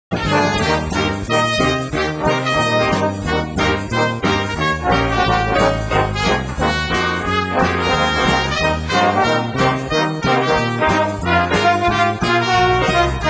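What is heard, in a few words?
trumpet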